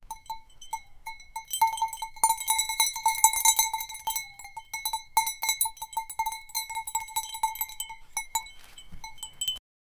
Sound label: a 3 second shot a cow, farm